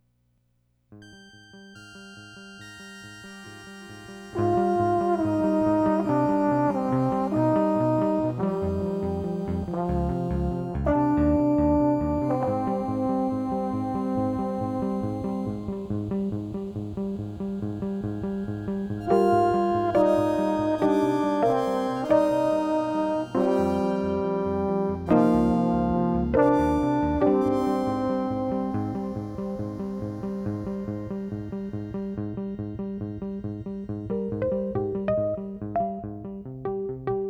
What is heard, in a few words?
Reed Ditty